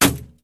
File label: Capsulte Impact